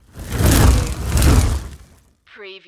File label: Fireball Pass By Heavy